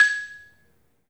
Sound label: 3D MARIMBA; 3D Sound; 3D Keys VOL-1